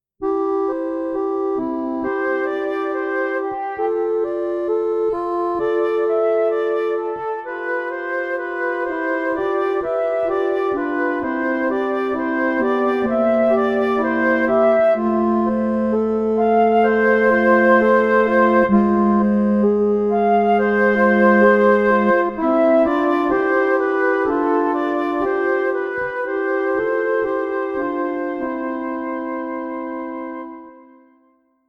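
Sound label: Andante Accordion Flute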